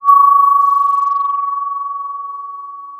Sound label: Sound of a phone ringing